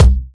rich mid-range bass drum one shot C key, bass drum sound